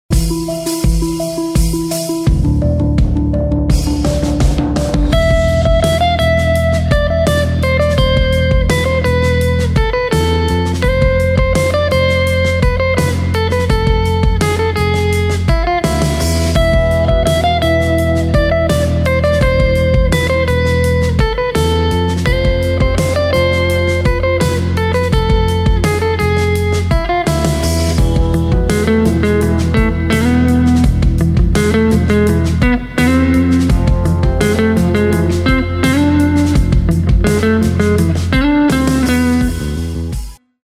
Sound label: Phrygian Dominant Scale